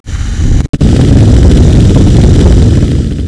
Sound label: flamestrike